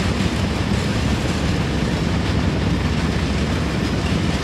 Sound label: train engine driving; factorio 2.0.55